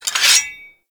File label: Axe Equip; Warframe; Foley